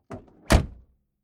Transport Car Drivers Door Closed Hinge Noise Solid; Bentley Eight; Car Sound Effects